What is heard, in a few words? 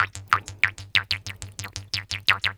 KHOMUS